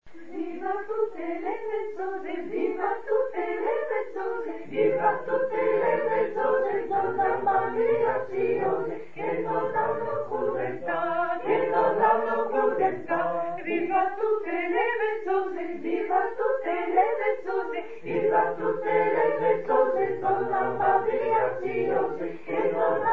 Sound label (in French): Tutti Repetition; Viva tutte le vezzose